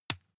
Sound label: juggle catch soft